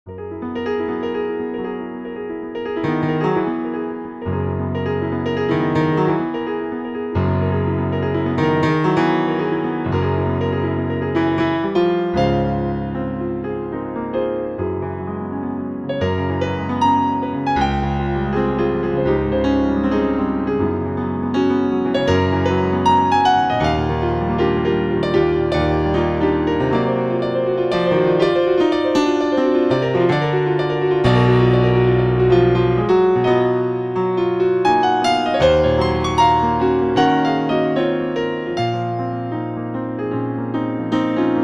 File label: impro, bosendorfer; Mitchel Forman; pianoteq8